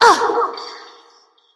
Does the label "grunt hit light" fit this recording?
Yes